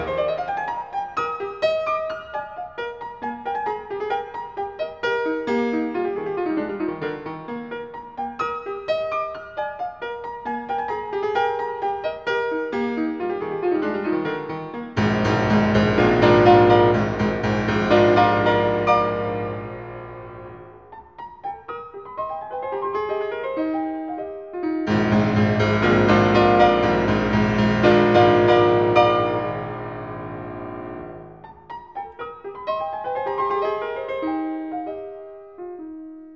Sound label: Variations and fugue for piano in E flat major